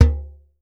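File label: earth tone, African set percussion; Voice Crystal - Steve Reid Definitive Percussion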